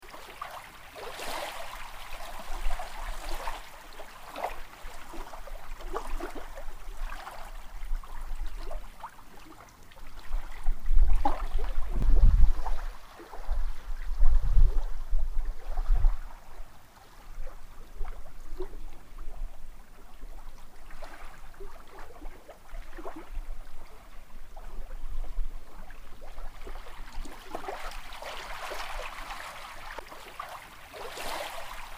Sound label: Lake Rotopounamu Wind Waves; new zealand soundscapes